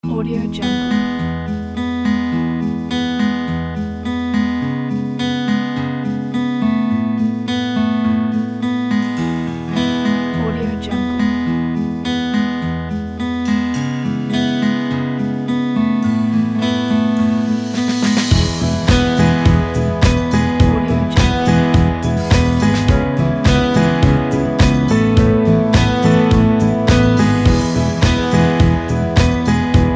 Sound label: uplifting acoustic